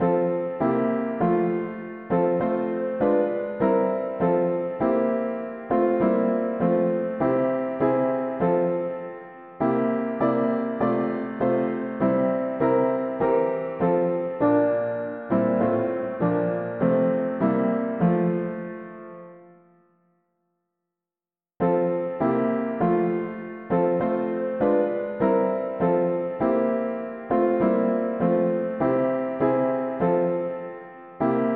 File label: Come Thou fount of every blessing mono piano; hymns